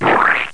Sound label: CANCEL; Educational Software Cooperative 4